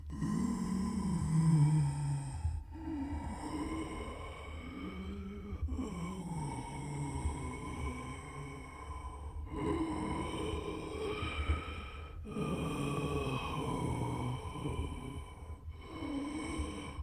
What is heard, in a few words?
Waterway Breath Loop; Gs Waterway Breath Loop